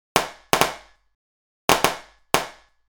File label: Studio Impulse Response